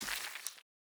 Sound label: big Egg